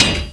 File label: Slamdoor Close; Playable Tutorial Level; TR1